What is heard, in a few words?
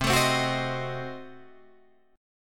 C Diminished 7th down Guitar Drop D